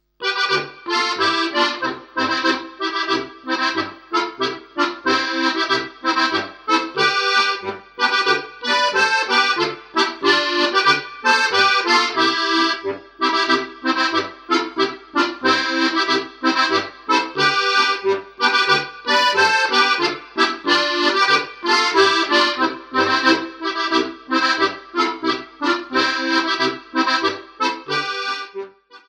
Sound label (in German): Boarischer (1. u. 2. Stimme)